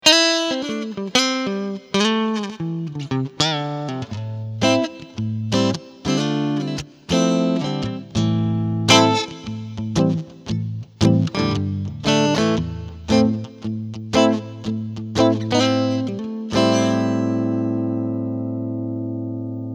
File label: Fronthumbucker Clean